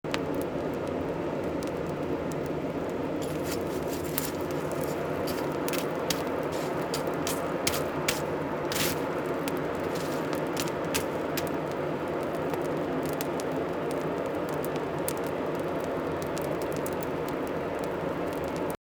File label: fire oven; best service Blue Box